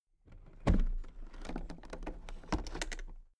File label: toolbox open soft